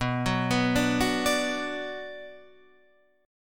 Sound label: B-Minor, Guitar, Half Step Down